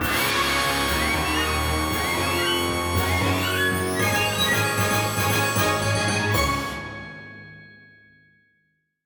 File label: Jingle Grandopening; My Little Pony Color By Magic; android